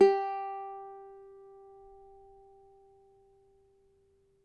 CAVAQUINHO G, Guitars; Spectrasonics; Hans Zimmer Guitars VOL-2

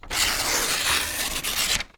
PAPER, TEARNG PAPER; AKAI S-Series CD-ROM Sound Library VOL-6

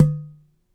Udu Hit Pop; Sonic Foundry (Sony Creative Software); Joe Vitale Latin Percussion

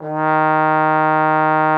TROMBONE D#3; Xsample VOL-3

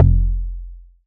BAS F1, Yamaha TX81Z synth bass

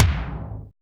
aggro tom lo; Drums In Your FACE!

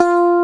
EG MUTE F3, FUNK

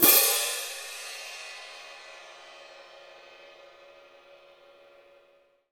CYM PIATT

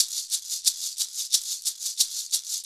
MARACAS; Sonic Foundry (Sony Creative Software); Joe Vitale Latin Percussion

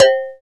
FM Perc; Rap

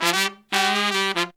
horns, 160 bpm; 90 s Sample CDs; Zero-G; Phantom Horns

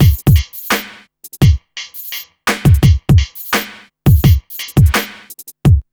BEAT, Hip Hop; USB Soundscan vol.34; Burning Grunge Hip Hop; AKAI; TIMBA

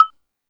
Bell, Yamaha DD-20

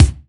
BD, BASS DRUMS; EdgeSounds

